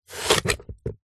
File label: apple chop knife wooden chopping board